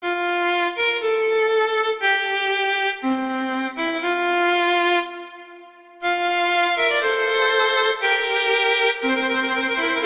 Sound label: Largo; Telemann; Canonic; Sonata 6